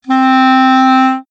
clarinetto

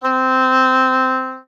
obo c, additiva; oboe; Nel complesso il migliore, perché in questo suono il rumore ha minore influenza.

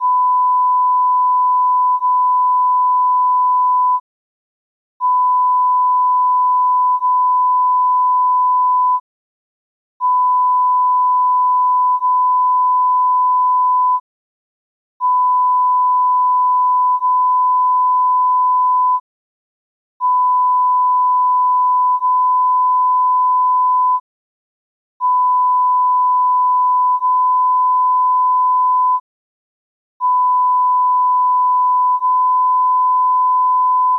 varie coppie di frequenze; la prima è sempre 100

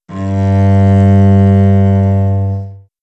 cello g, violoncello

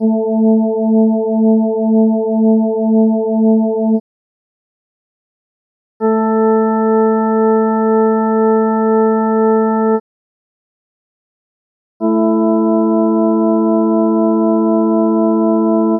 3 suoni, battimenti complessi; battimenti complessi, contemporaneamente di prima e seconda specie

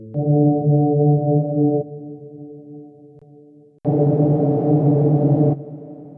suoni che contengono una certa quantità di rumore

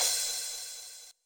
piatto di batteria

